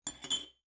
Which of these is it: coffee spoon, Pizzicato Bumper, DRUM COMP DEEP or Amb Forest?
coffee spoon